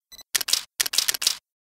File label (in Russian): Фокусировка и несколько кликов затвора